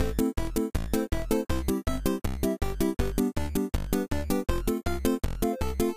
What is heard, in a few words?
Retro Comedy, Music Loops